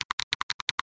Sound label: new spider sound; Le manoir hanté; new spider sound for joe